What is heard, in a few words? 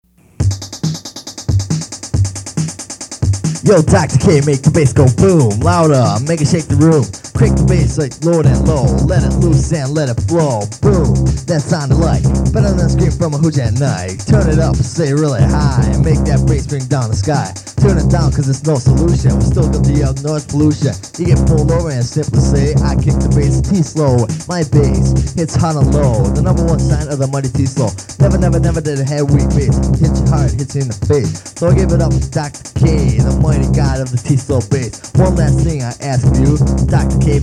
make the bass go boom